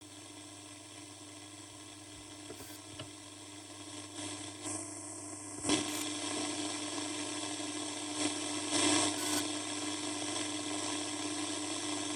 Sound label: tuning